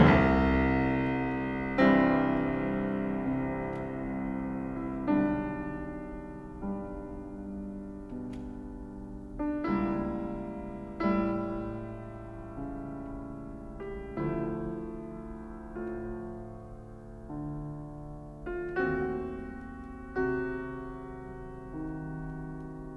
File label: 32 Variations in C Minor for Piano on an Original Theme, WoO 80 Var. XII. Maggiore; Beethoven; Var. XIII